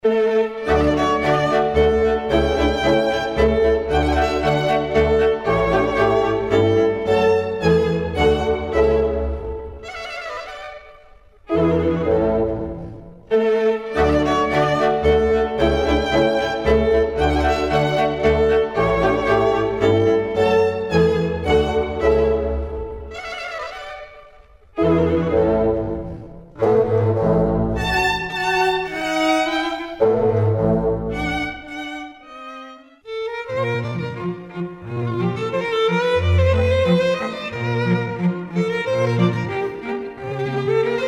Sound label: classical